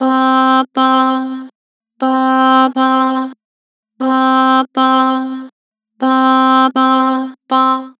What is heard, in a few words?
"Bah", Vocals; Harmor